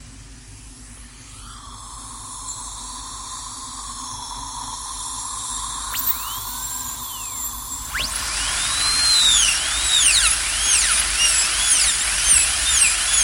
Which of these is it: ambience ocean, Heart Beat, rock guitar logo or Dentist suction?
Dentist suction